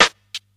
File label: old school wet rap acoustic snare g key